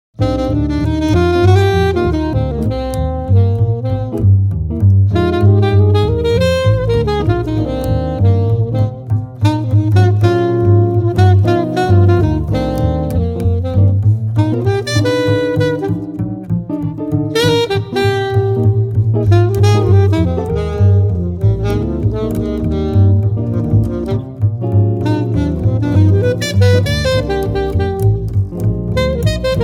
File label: Guitar Trio